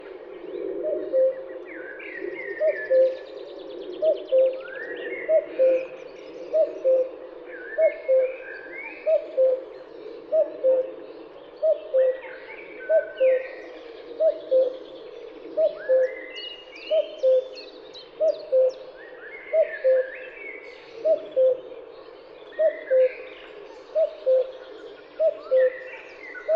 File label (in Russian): kukushka cuculus canorus, zvuki kukushki